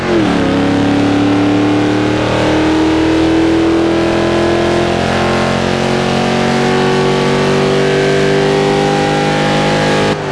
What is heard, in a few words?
third, vehicles; gmod; tdmcars; raptor svt